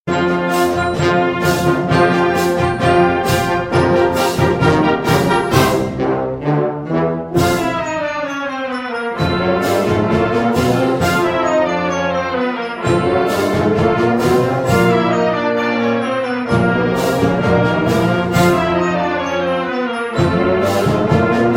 good time chromatic blues; excelcia music

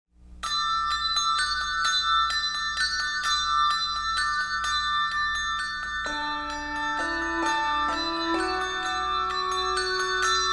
Handbells, Handbell Music; Publisher: Jubilate Music

